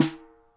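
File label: snare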